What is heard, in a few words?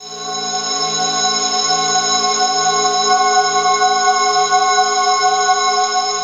Mystery Pad